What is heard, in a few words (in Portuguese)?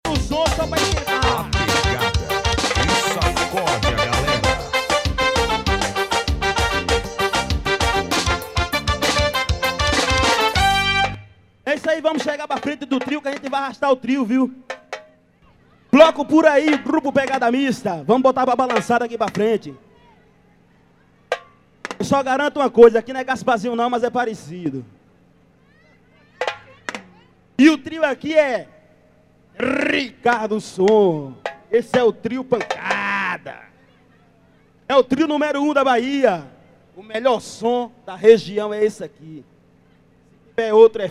passagem de som